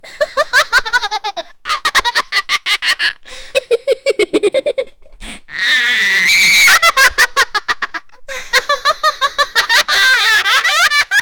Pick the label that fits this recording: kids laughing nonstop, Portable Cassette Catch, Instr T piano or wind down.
kids laughing nonstop